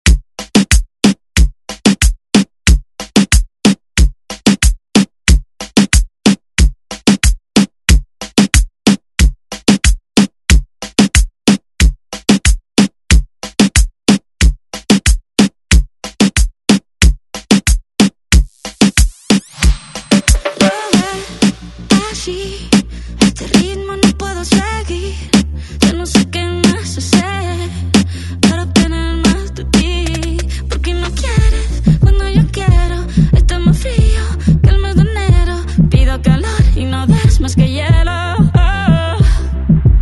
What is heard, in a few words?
Re Drum; DJ Mhark